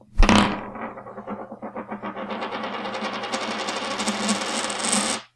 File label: coin spin light